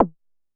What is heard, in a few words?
Pearl Drum-X1